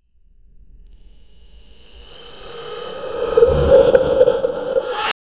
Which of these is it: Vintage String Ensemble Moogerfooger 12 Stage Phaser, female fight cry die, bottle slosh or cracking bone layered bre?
cracking bone layered bre